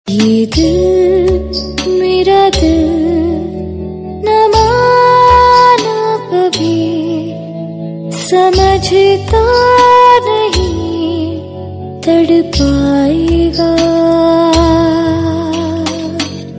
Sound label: Female; TV Serial Tone; Channel V